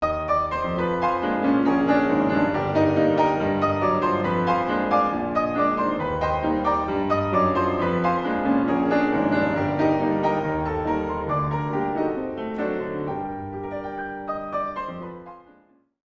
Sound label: Ragtime Nightingale